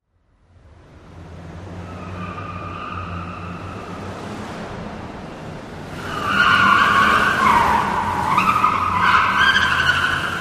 Wagoneer: Various Driving Maneuvers In Parking Garage, With Reverb Tire Skids, Jeep Wagoneer